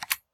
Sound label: keyboard space; Golden Crayon Sounds